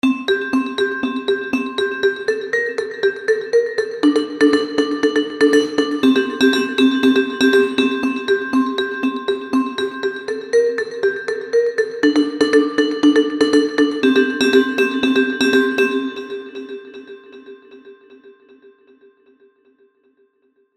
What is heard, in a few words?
Vib Percussive; Vibrafon